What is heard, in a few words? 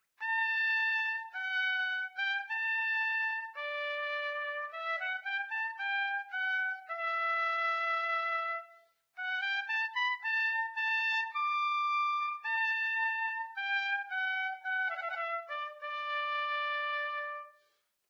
Trumpet Little Ditty; Instruments